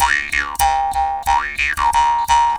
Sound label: D 93, JAW D 93BPM, Primitive Instruments; Didgeridoo and Other Primitive Instruments